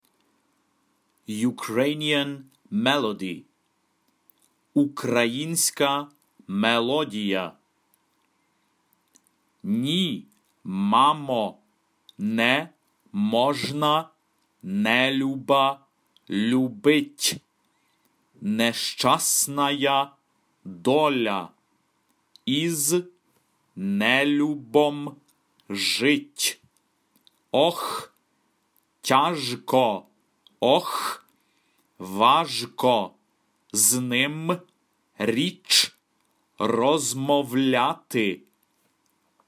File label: Ukrainian Melody